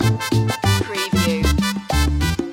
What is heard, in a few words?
loop, latin spanish; world influences